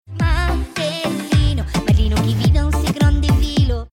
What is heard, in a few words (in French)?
sonnerie madelinot